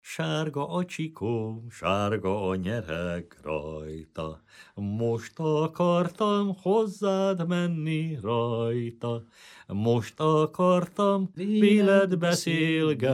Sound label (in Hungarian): nepdal; sarga csikom; nepdalok